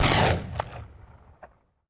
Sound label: Benelli 12 Gauge Shotgun Blast; Sony Pictures Sound Effects Series Volumes 1-10; Sony Creative Software